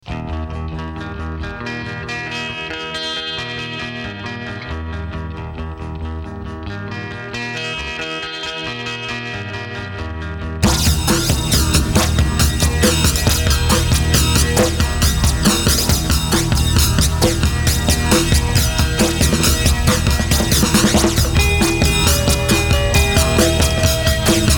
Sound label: arpeggiator